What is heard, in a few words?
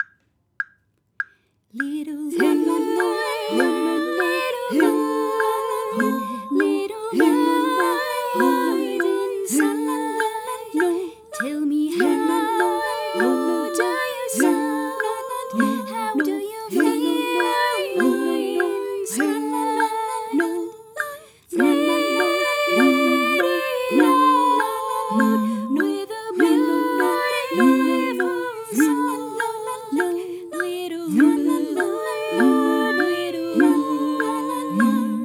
inside vocal